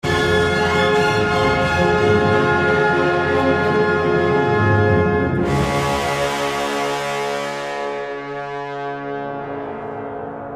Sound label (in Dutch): Trompet; Rheingold